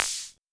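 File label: Hi-Hat Open, Luxor Passet; EKO Rhythm Box; Drums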